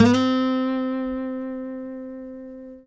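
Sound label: trill, Guitar; Quantum Leap; Guitar & Bass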